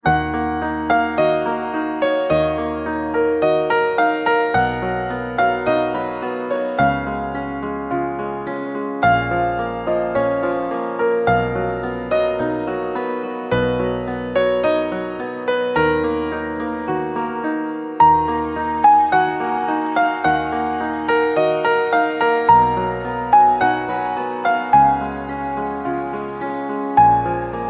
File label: best romantic piano ringtone